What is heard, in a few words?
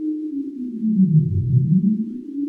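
Ambience Sci-fi Energy Loop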